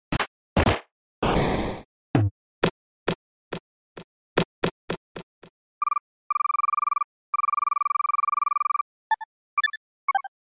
SNES RPG

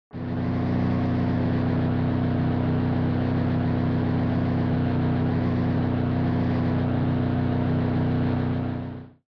SNES Racing Starting Grid

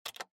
PC Keyboard Enter

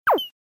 Arcade Shooter Damage

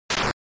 SNES RPG Damage

